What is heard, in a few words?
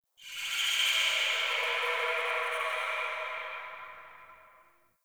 Ext Bat Chatter; Misc Outdoor